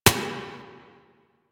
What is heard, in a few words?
Turret Low Clip; Lasrian APC